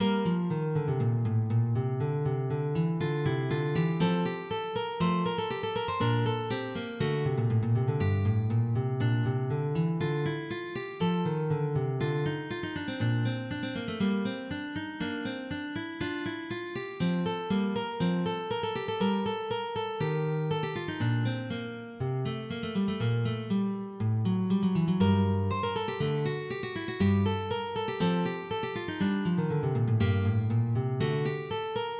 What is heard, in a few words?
Ricercar, MIDI